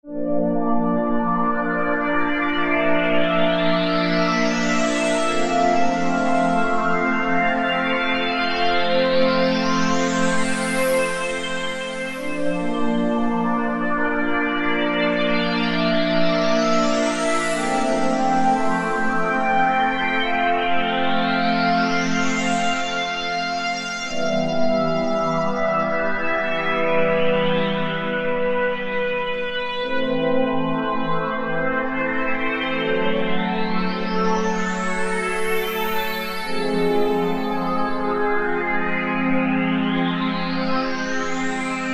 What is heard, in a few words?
SYNTH TEXTURES - Sweep; Samoa Sweep; Apple GarageBand 2